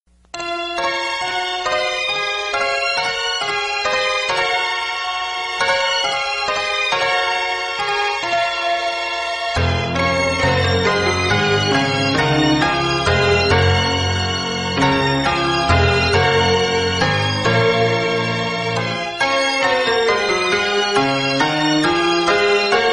Instrumental

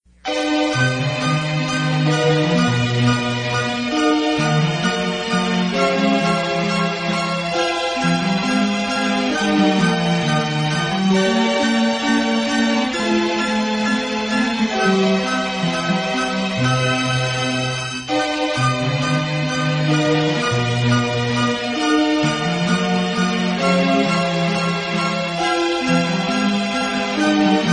Instrumental

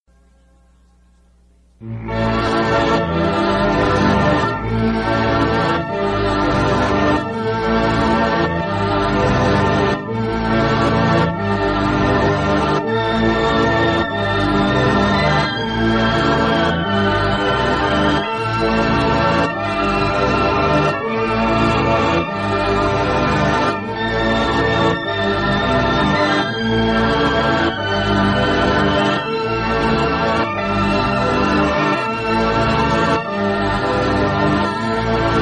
Instrumental

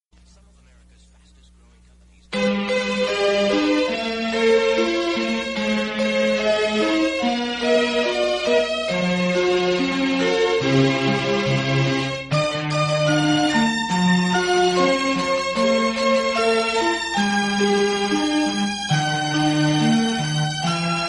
Instrumental Variations

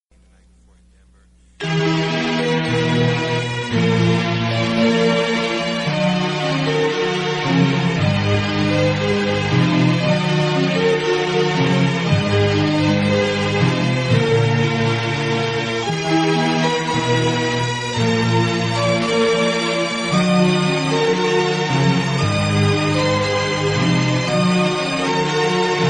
Instrumental

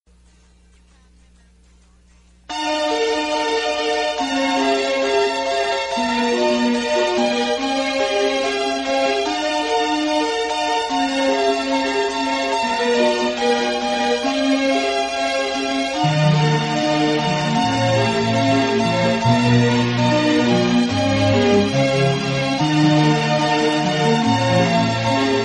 Instrumental

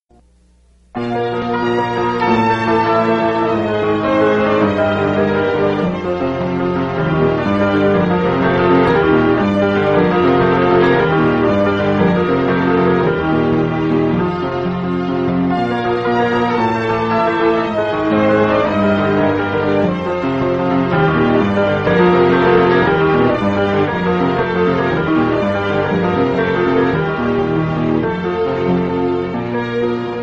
Instrumental -

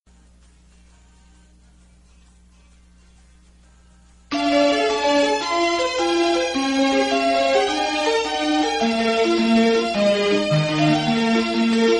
Instrumental

